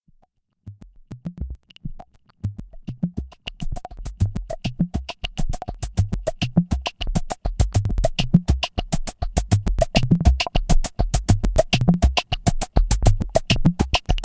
ms20 4 track

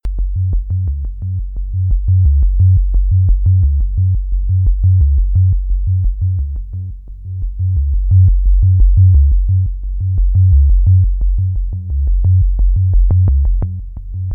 Polymath Sequence

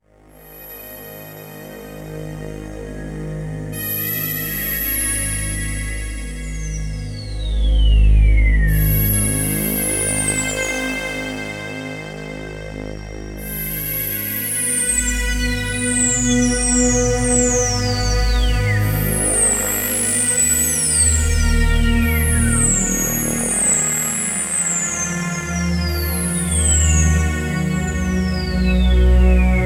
jd800 bandpass filter

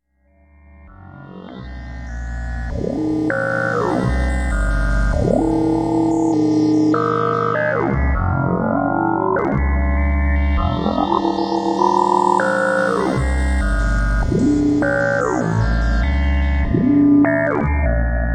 Ensoniq Fizmo Perpetuum

